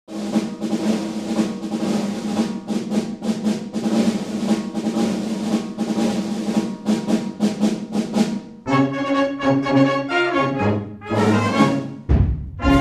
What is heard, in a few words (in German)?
Narrenmusik Marsch; Radolfzell; Narrizella